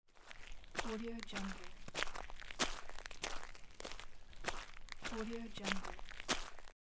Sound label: steps on sand